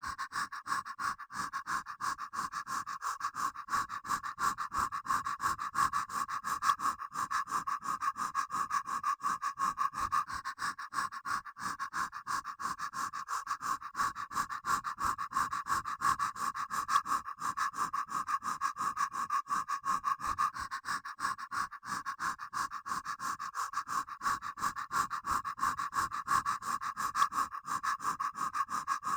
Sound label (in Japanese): ハッハッ 早い